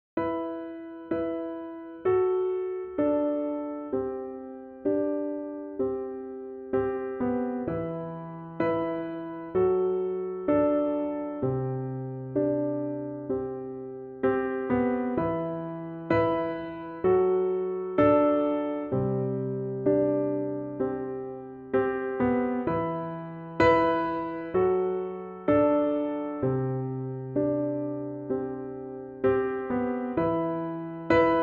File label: piano